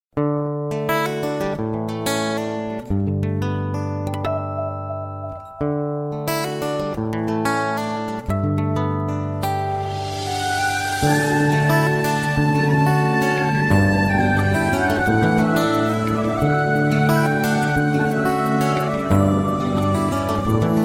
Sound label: love tone cute ringtone